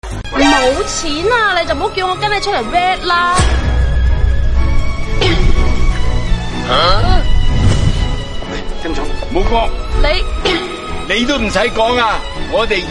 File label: laugh; breadradio